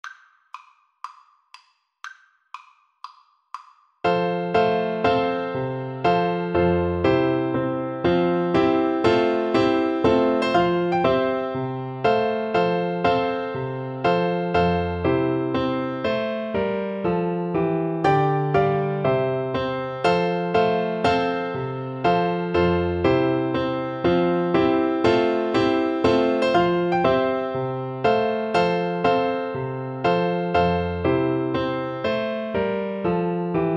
tpt tune BN, Trumpet Tune for Bassoon; Purcell, Henry - Trumpet Tune; clarke

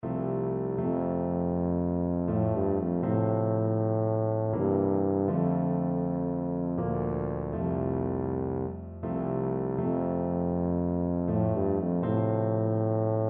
amazing grace, tuba

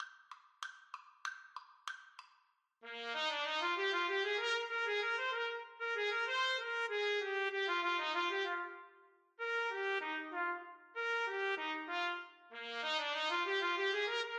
trumpet duet

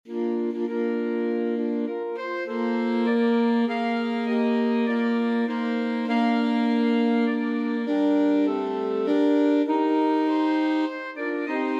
nocturne, saxophone ensemble; mendelssohn

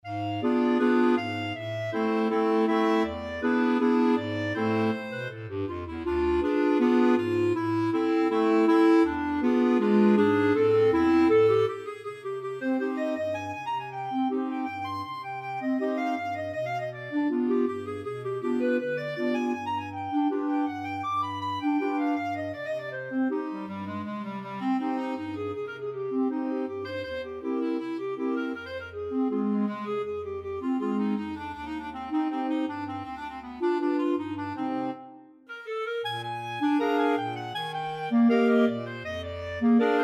clarinet choir

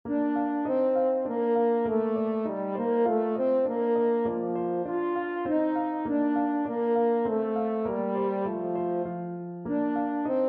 melody, french horn; schumann

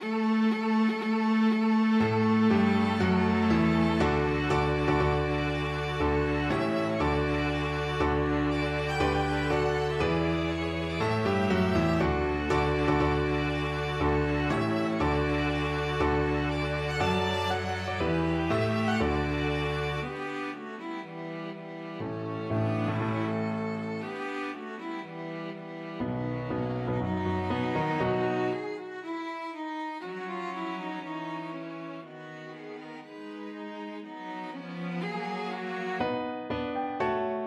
wedding march, piano quartet

